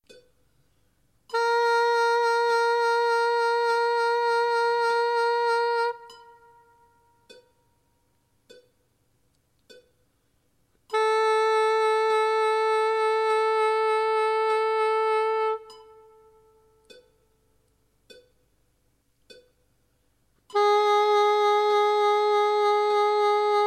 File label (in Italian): esempio vibrato quartine